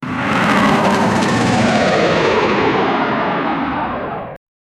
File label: missle flying by; flyby